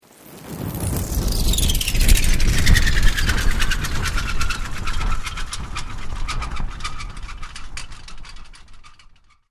Sweep Mechanical Slow

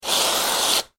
Food Whip Cream Can Squirt Long; Whip Sound Effects; Whips (Sound Effects:Sound Design); SFX Bible 6

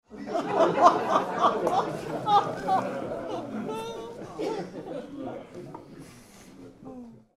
Laughter Small Mixed Group Interior; Voice

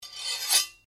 Blade Scrape Flick, Sword; Foley sounds for melee weapons; Combat

